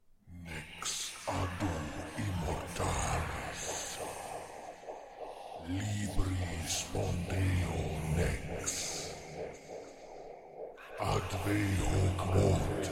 Demon Chant Latin

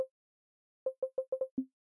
Menu Select Sound

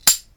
Iron Click

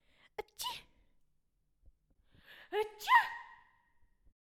Sneezing

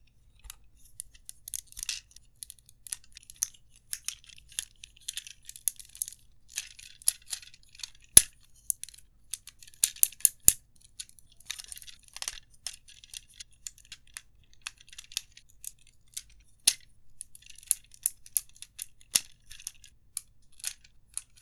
Plastic Clicking And Rattling

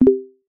Notification Sound

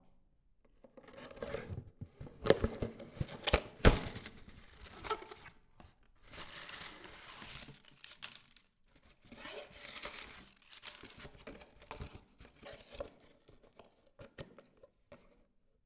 Package

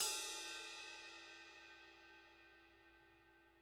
Crash 18 Tip

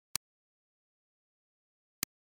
Light Switch

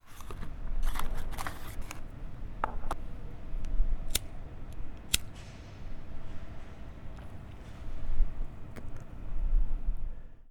Light up cigarette

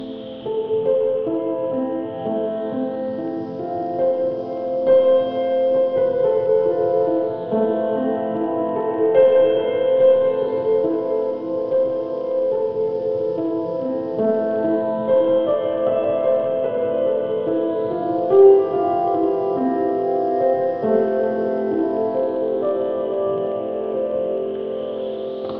Piano Ambiance

